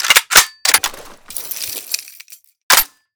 MG Reload Generic; Weapons Guns Interact

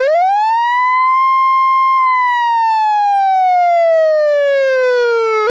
police siren; Vehicles; Civ

